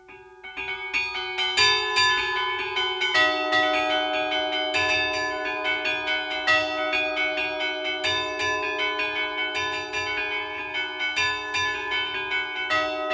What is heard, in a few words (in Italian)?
Campane, Glori; Imperia; Liguria; Archivio Balma Campane Liguria; Polifonia